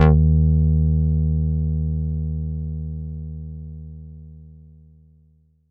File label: Rubber d#2, Korg Poly-800